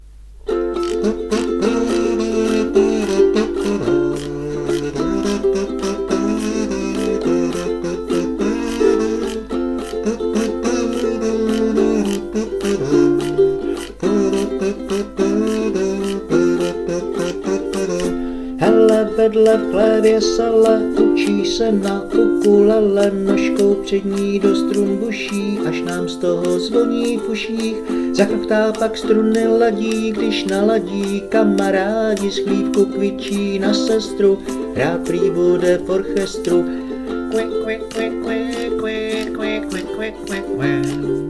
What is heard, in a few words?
ukulele